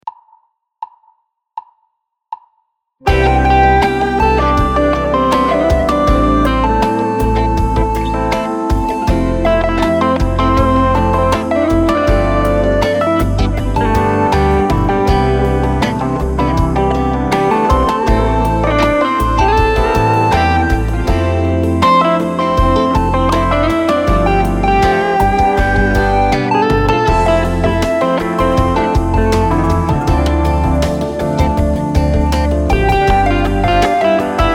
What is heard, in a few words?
Soft